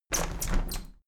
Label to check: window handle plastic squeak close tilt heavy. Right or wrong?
Right